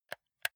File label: console controller r1 press slow